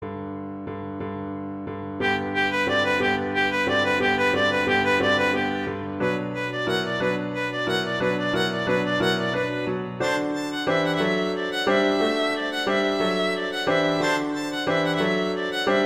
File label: Spring Dance; Grieg; Lyric Pieces Op38